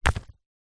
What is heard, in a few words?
small rock drop forest floor; zapsplat; foley